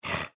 Leopard Growl Light; Leopard Sound Effects; Animal

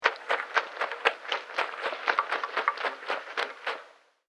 Dog Wet Shakes Vintage; Dogs (Sound Effects:Animals)

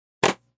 Rap Hit

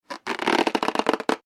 balloon rubbing squeak; Balloon Being Rubbed With Hands - Good Cartoon Stretching / Expanding Sound; Funny Sounds (Sound Effects:Comedy); McKinney Sound

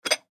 Glass Dish Pick Up; Dishware Sound Effects; Household; Foley; Blastwave

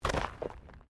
Body movement Impact Roll Gravel

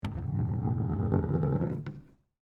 Ski Ball Roll, Arcade, Back, Down, Slope; Technology; BlastWave

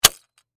Rat Trap Snap; Foley; Mousetrap; Machines; BlastWave

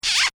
Comedy Effect Kiss; Funny Sounds; Bobby Cole

